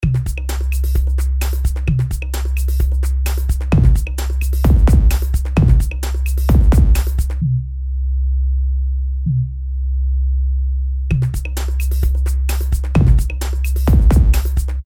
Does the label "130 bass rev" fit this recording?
Yes